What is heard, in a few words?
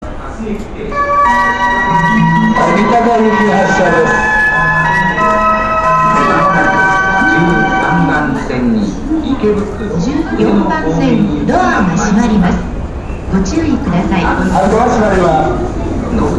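Departure Melody; 駅名連呼（ATOS版）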